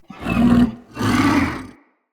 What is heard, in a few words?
Corrupted Battle Dog Stun Idle, Animals, Vocals